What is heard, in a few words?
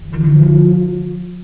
rocket groan, ambience